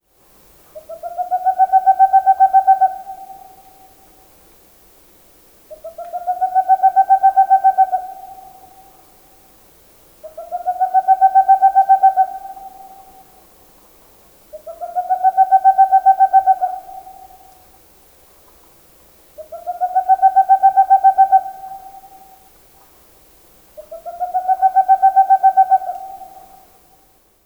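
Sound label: BARRED OWL, Songs; The Ultimate Birder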